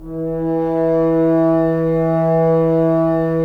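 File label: Solo French Horn, Brass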